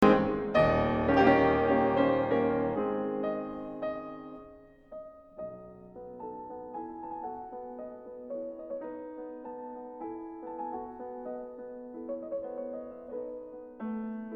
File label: Polonaise op. 26 no. 1 C Sharp Minor